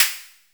NOISE HAT, synth perc; Zero-G; Ambient 1

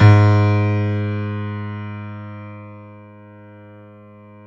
PIANO G#2, STEINWAY; Greytsounds - Philip Wolfe David Crozier's Saxophones; GR8 STEINWAY

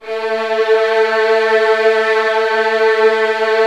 Virtuoso Strings

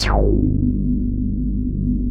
DEEP BASS; Masterbits; Dance Trax

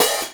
Hi Hat Open; Essential Percussion

